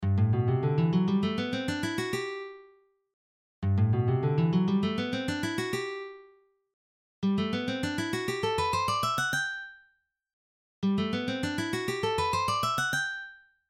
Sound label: Scales in G; Scales and Arpeggios